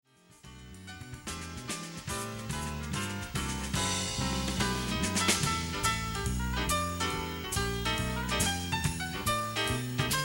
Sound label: jazz, drums; tasty, well executed, drummer